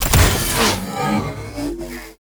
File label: Bullet Jump, Motorcycle; Warframe; Motorcycle Ninety Nine